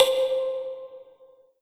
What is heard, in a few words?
Ensoniq VFX-SD Kalimba C5